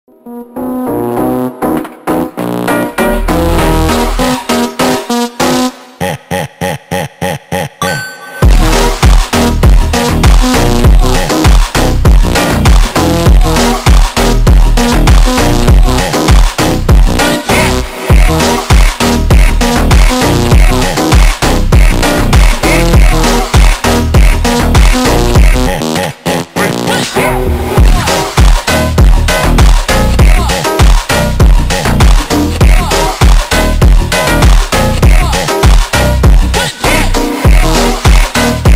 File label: super slowed reverb